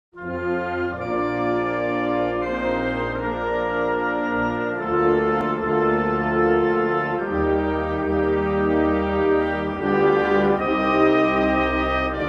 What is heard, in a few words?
anthem